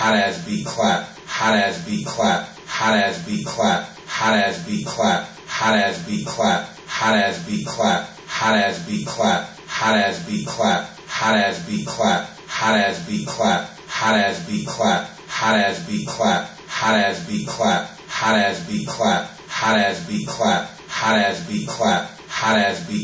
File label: Beat Clap